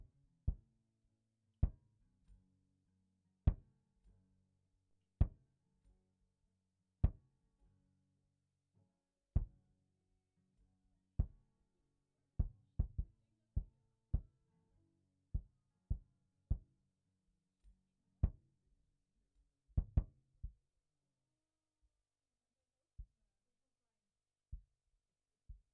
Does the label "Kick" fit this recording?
Yes